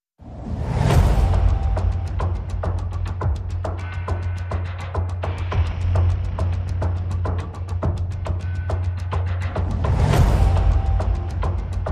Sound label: Percussion and Pulse